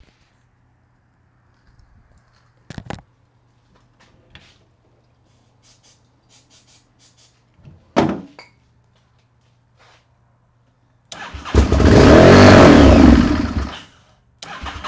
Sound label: no start starting